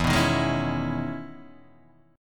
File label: E Major 13th E down, Guitar; EM13 strummed; Standard; JGuitar